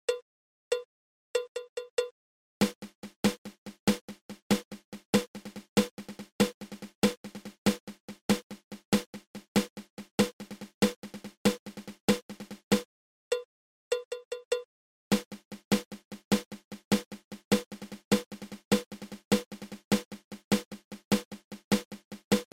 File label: Single Drag Tap